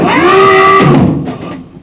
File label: Siren; LegoBlast